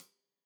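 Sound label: hat closed, hi-hat cymbals stereo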